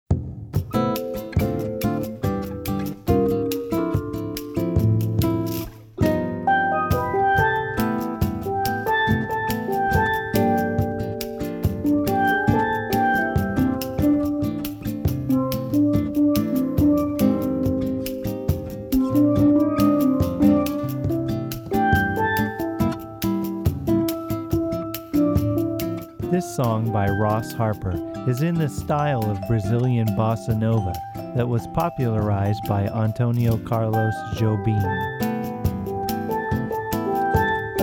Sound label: Bossa; Los Angeles, CA